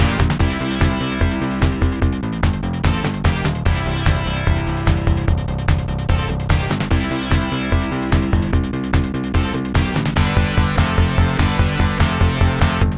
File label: music; Midway Games